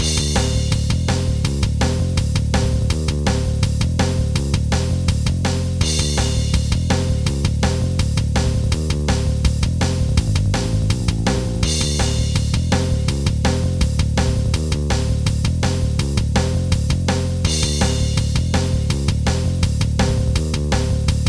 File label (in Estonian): drum ja bass; Trumm ja bass taustaloop